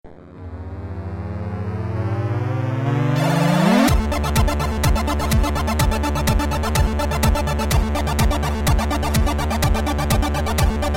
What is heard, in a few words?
Random chiptune